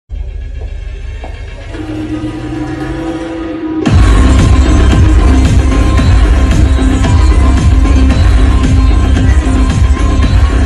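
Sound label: villain background music ringtone villain entry background music; raj b shetty; mammootty; vetrivel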